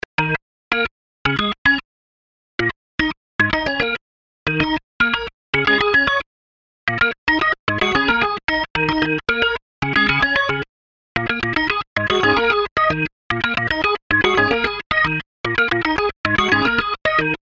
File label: Pondered Alarm, Organ; HTC